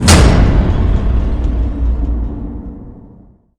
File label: Jump